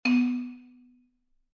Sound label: tone